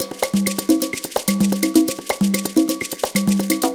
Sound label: Percussive Loops 128BPM; Vengeance Samples